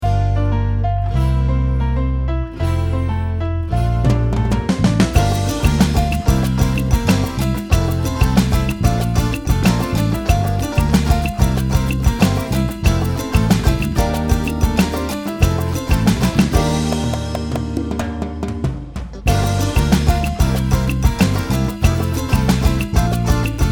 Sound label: Cha-Cha Fusion; production music; Afro Cuban Brazilian